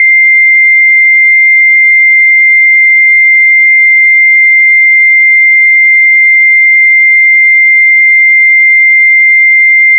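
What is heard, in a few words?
a sharp warning alarm tone, electronic beep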